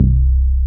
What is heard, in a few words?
CROMA, Synth Bass; BA1 Synth-Basses; Sound & Vision - Gigapack I CD 2